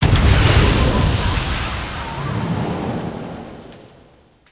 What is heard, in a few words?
ship explosion; destructibles